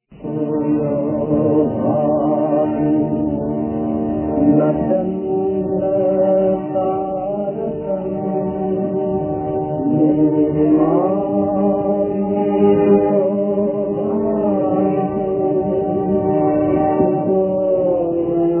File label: Sanskrit Chant; Nartaki 1940